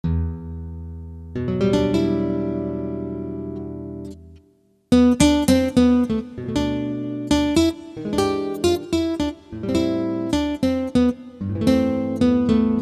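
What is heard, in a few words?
nylon guitar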